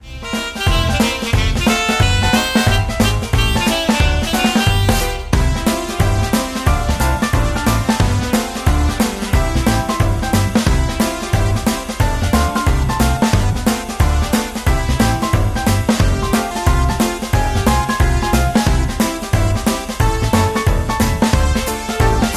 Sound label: Vesennaia pesenka karaoke